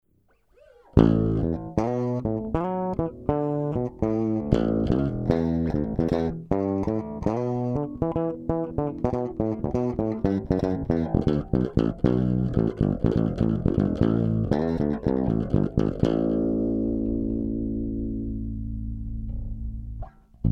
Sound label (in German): E Bass alte Rounds starke Kompression